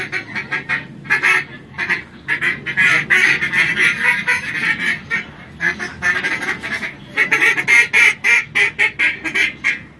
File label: duck; Одинокое кряканье утки